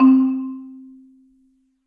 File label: Marimba Cloth Hits; Ableton; Orchestral Mallets